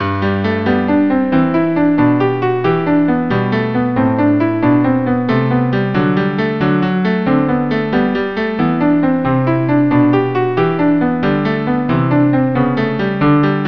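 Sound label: cello cello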